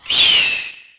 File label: bird attack; hero cronan